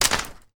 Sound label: pop up plastic framed goal small down on hard surface; sport; zapsplat